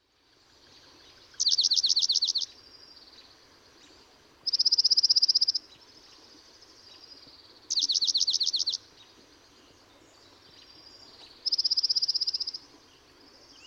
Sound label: Dark-eyed Junco